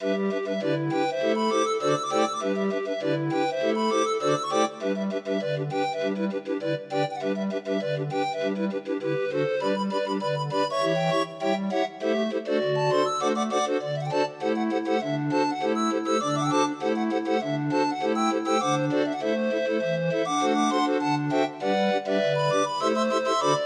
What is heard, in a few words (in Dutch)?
Als de zon schijnt, 25 note, Grind Organ; Arrangement by Mats Sijnesael; Demo of 25 note MIDI file